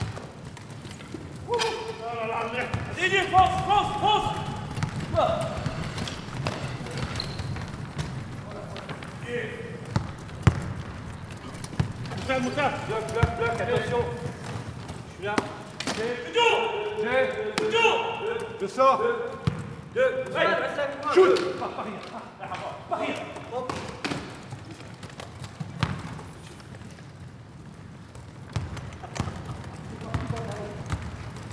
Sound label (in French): basket fauteuil